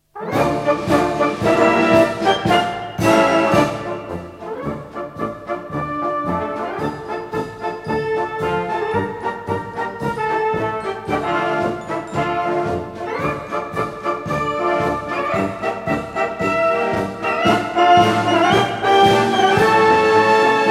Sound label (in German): marsch